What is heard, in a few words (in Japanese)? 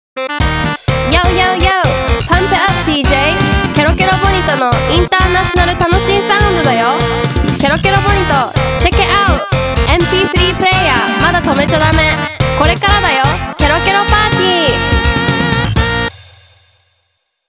ボニト・ジングル; Bonito Jingle